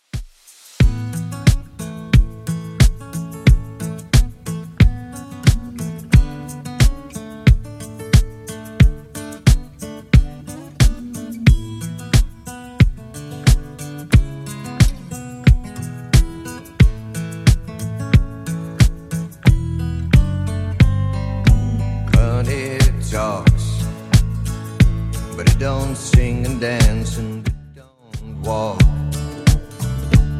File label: 70s Redrum 90bpm